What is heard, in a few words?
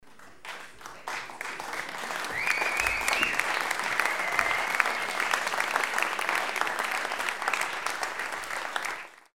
applause small